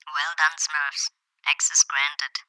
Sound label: access granted